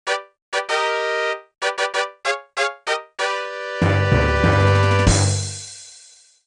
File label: fanfare